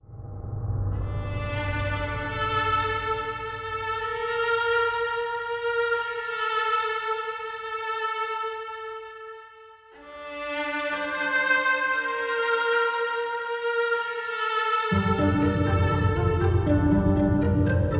Outro; Zombie Island

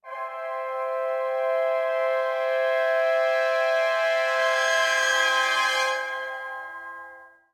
Bridge FLOURISH; Bolt

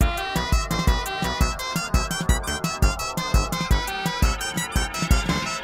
Cartoon; Clockwork Machines D

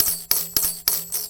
Tambourine Missedn; Levels Hub Diner; Light Street

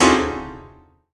break piano keys; stranglehold

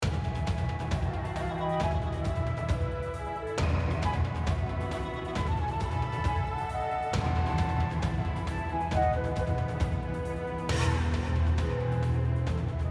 Music; Tower Climb

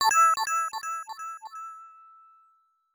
Coin

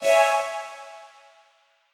Quasar Clear B

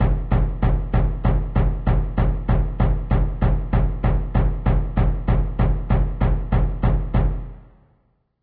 Woodwinds Drums

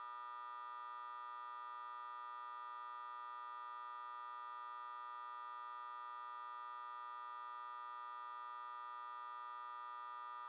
light fluorescent; teardown; ps5 windows xbox series xs

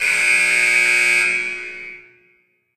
Special Basketball Buzzer; Sfx - Special Basketball Buzzer 01

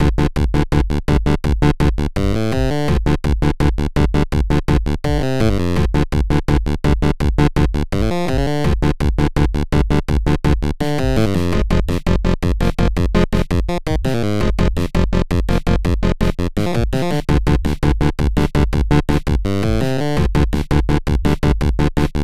BATTLE 8 bit

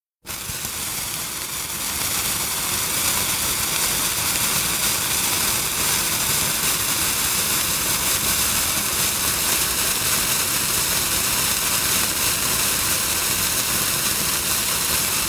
fuse light; the 39 steps

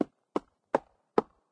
Building Wood

